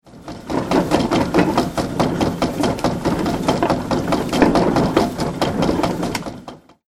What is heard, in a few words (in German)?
Müller Mahlgang